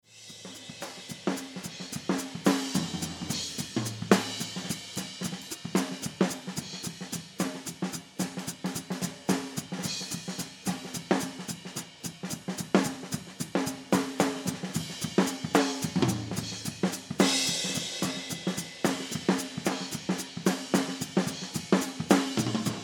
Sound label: Drums HH